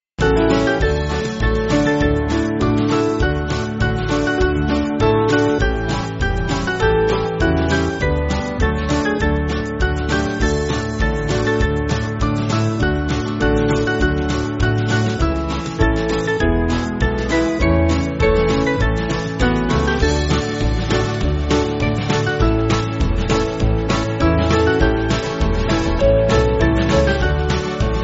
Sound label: Band, Backing Files for Churches; To The Work; Doane